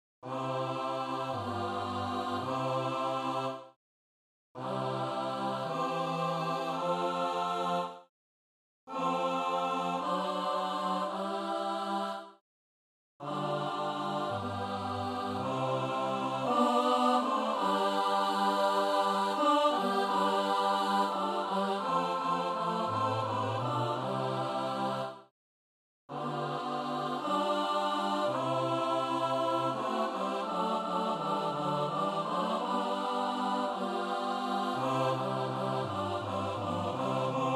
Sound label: SATB backing practice track